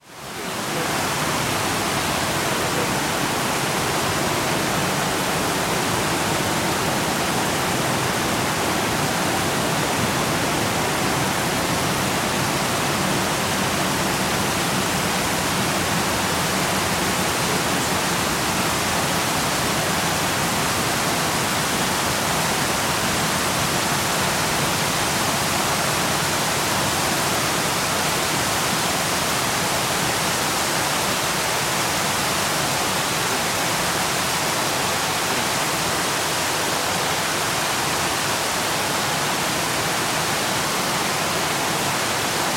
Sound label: Waterfall; sound effects bible